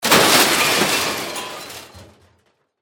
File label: Crash Metal; Crashes; Objects; BlastWave